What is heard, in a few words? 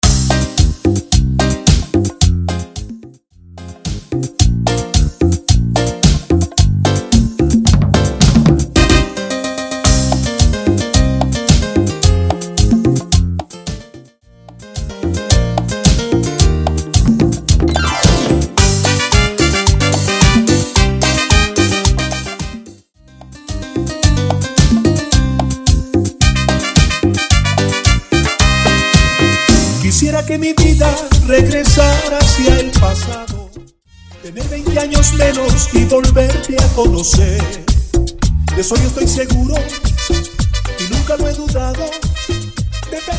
Intro Piano 110 Bpm